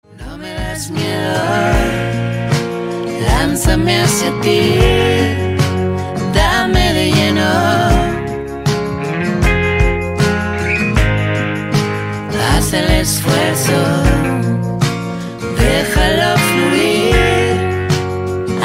histericos

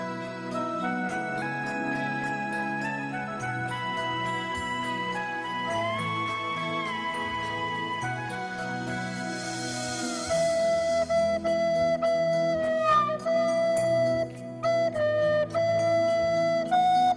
Titanic Flauta Chistosos

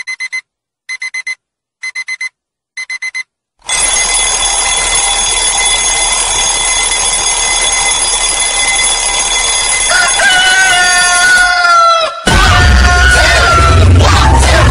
Despertador Fuerte

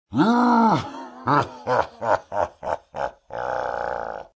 risa monstruo

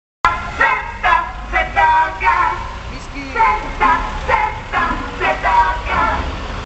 zeta gas

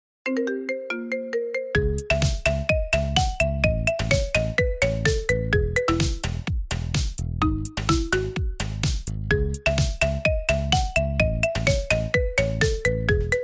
Marimba, tono de llamada

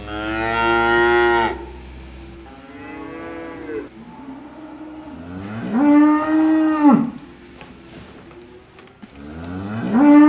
Sonidos De Vacas

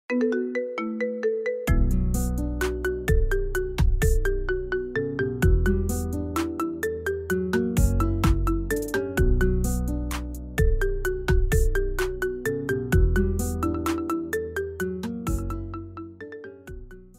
Marimba, tono de llamada

